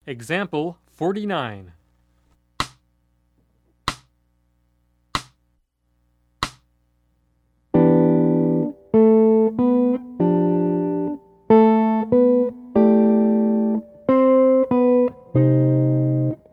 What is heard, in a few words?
Jazz; jue shi ji ta